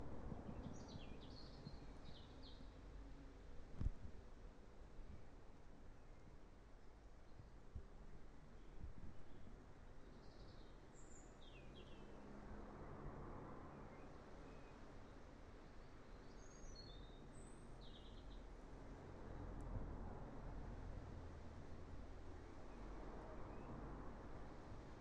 bank holiday birdsong bird singing in the rain is it a blackbird